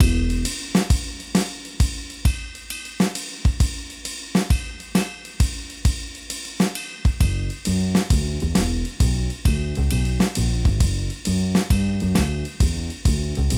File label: jazz; Mutually Assured Destruction; StudioFreresChasseurs